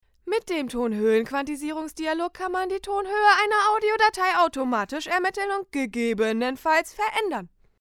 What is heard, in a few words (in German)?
tonhoehe quantisiert